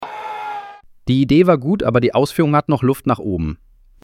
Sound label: pass fail